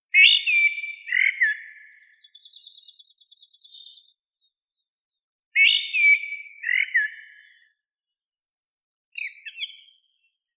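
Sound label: black-throated thrush, Turdus atrogularis; drozd chernozobii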